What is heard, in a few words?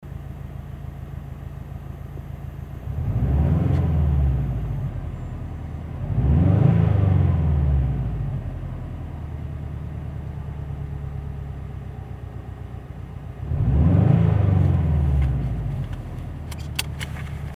3000rpm INSIDE